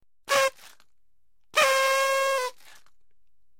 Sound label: party, whistles; magicworld